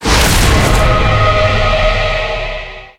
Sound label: lose window; aftermath